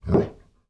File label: ambient short